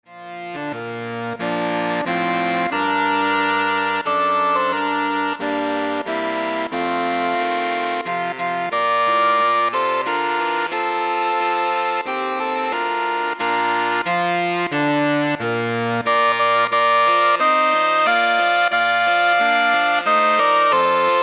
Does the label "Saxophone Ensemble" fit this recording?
Yes